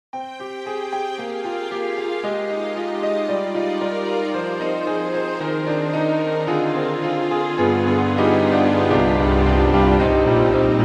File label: Film Piano; Hybrid